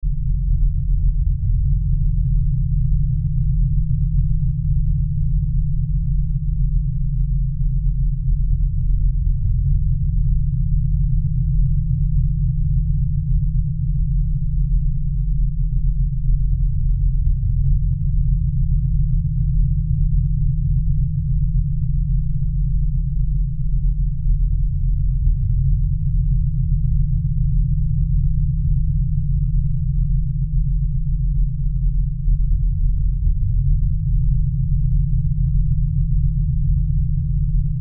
Sci Fi Spaceship; Spaceship Corridor Hum Drone Lfe Rumble; Spacecraft

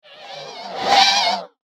Bizarre Vocal Whoosh; Whoosh Sound Effects

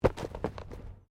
Body movement Impact Roll Road

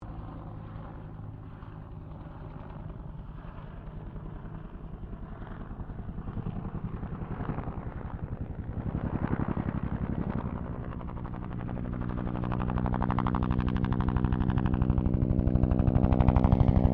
HELICOPTER 1967 BELL UH1 IROQUIOS PASS; RAN UH-1 Iroguios; Helicopters (Sound Effects:Vehicles)

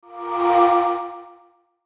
Sweeper Airy Swell Delay, Descend Airy Whoosh; Airy Sound Effects; Production Element Imaging Element Accent Transition

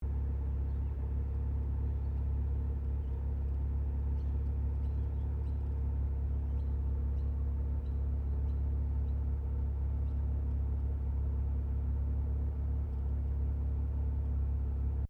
CAR MAZDA RX7 SERIES3 INTERIOR IDLE LOOP; 1983; Cars; Vehicles; SoundEffects-Foundation1

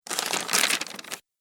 Paper Movement; Paper Sound Effects; office sound effects

